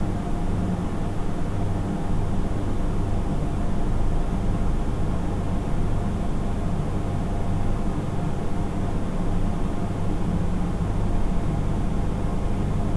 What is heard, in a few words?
Industrial Basement; de alliance